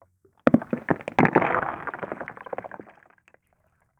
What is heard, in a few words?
big rock rolling